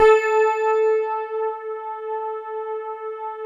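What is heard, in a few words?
GLASS Rhodes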